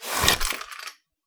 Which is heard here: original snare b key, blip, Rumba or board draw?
board draw